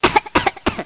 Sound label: failure